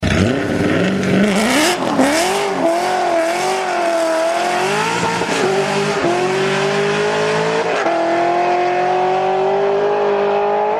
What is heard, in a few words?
zo6 corvette, engine car sounds